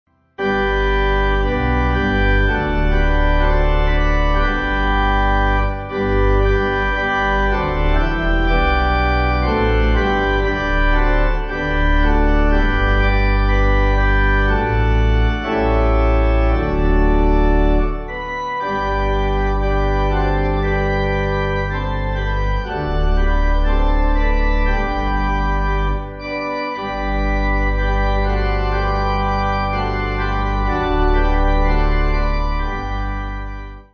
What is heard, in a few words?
pipe organ